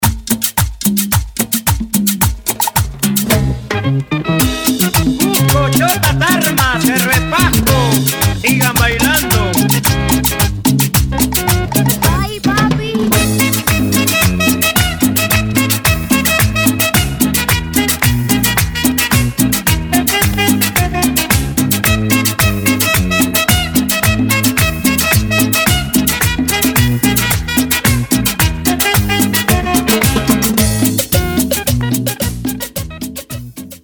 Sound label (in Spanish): Intro Acetato Outro, BPM 110